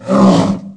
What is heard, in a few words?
Swipe Left, Ice Beast, Aliens